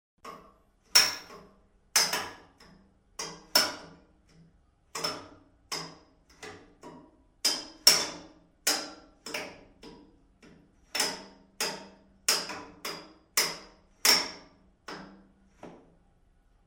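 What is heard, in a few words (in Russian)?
по металлической трубе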